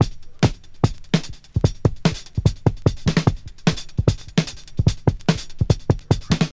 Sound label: 148 bpm modern drum loop A key